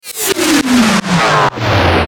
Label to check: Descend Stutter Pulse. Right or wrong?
Right